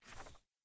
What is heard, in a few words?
corner release